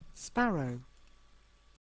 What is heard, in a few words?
sparrow